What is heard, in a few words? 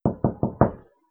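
Door knock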